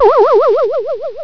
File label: VIBES; EFFECTS